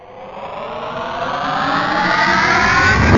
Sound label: dream rush